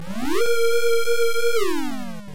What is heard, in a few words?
siren mono